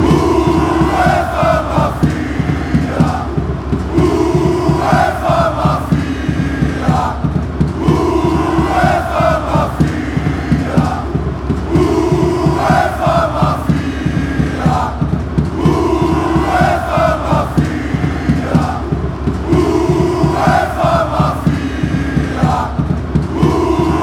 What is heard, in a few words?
chants, greece